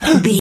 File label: voice male breath shock alert